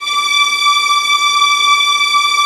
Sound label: VIOLINS, Synclavier Strings; ILIO